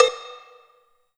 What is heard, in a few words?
Cowbell